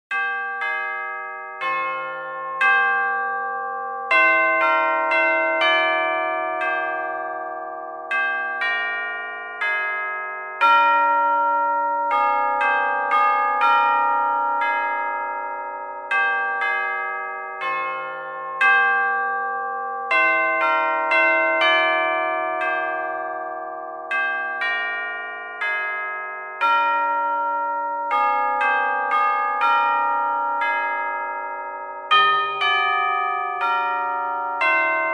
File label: Chimes Of Gloucester Cathedral